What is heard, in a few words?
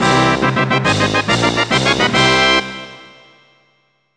Collect a Secret Item Fanfare; Banjo Kazooie